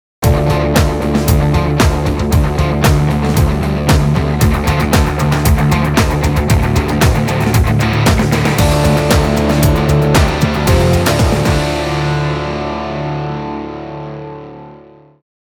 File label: grooving guitars